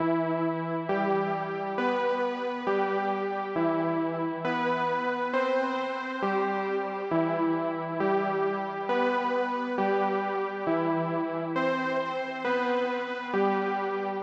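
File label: E minor 135 BPM synth melody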